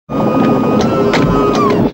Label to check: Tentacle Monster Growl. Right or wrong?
Wrong